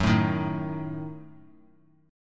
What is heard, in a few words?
F sharp Minor 6th F sharp; strummed; JGuitar